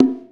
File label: Conga High; Essential Percussion